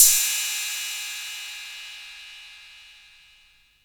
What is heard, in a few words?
Royality free cymbal drum sample tuned to the D note